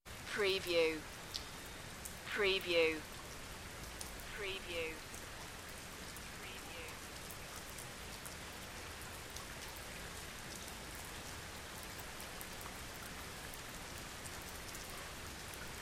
Medium Rain Onto Trees And Leaves

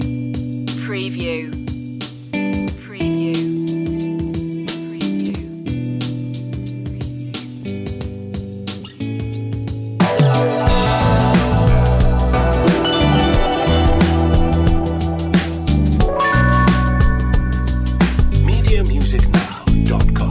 slow funk reggae

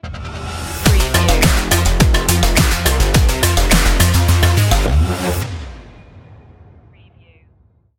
funky stinger

